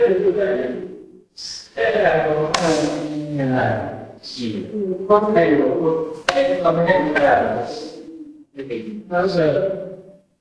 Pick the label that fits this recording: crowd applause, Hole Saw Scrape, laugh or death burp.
crowd applause